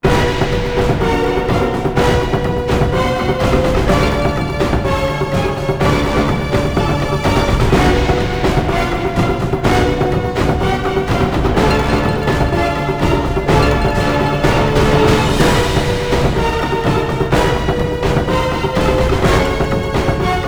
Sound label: intense; chase young; Xiaolin Showdown; XBOX